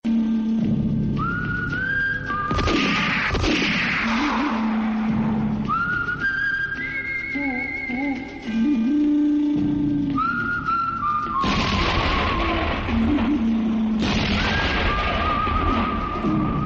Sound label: movie ringtone